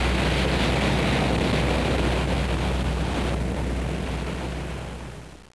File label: wave